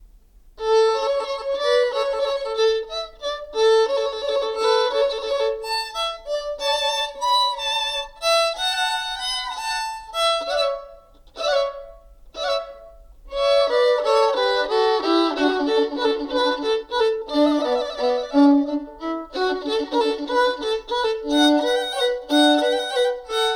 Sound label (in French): DUO VIOLONS; CONCERT RADIOPHONIQUE A L’AUDITORIUM XENAKIS DE STAINS